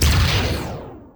Pulse Phaser; Star Trek

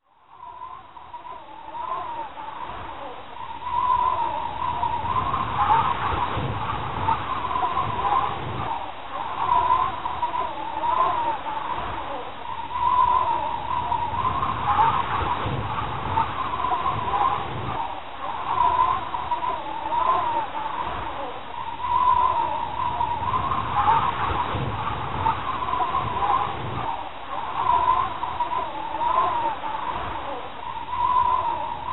Wind, Halloween

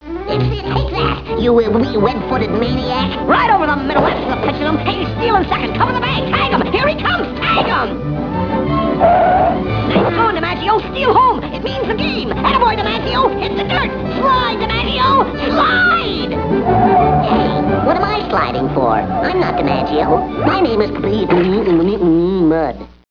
SLIDE; Loony Tunes

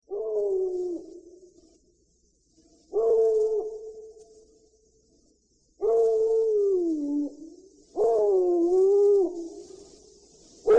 Lone Dog; Halloween

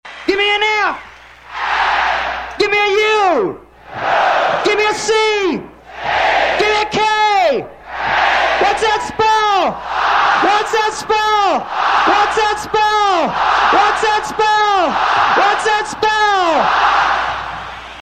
The Cheer

